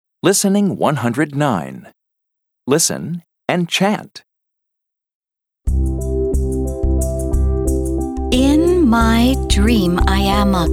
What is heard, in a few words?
Chant; Family And Friends; Family 3